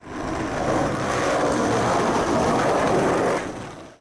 skateboard; Power Rangers vs Teenage Mutant Ninja Turtles - Ultimate Hero Clash 2

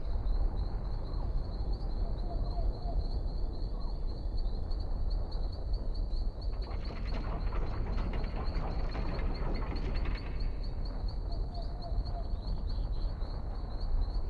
ambience temple; Ben 10 - Omnicode

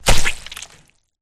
landing; Nick Summer Sports Stars

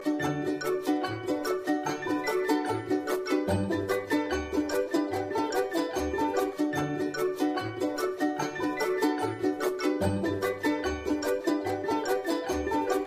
music menu loop; Gumball - Go Long!

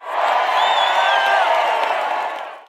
crowd; Nickelodeon - Destruction Truck Derby

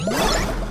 super dunk, basketball; Nickelodeon Basketball Stars 3; ThrillGames

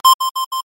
Boost Speed Alarm; The Powerpuff Girls - Mojo Mayhem; NextlevelGames

